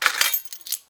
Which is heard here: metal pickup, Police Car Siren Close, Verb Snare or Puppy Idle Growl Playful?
metal pickup